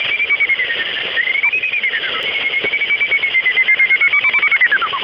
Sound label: Greater horseshoe, bat; Darren Maynard